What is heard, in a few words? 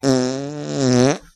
fart